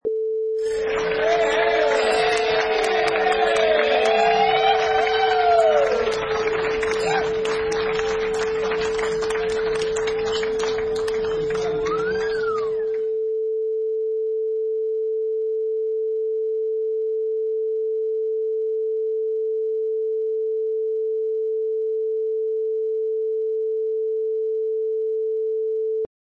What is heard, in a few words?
Banquet Cheering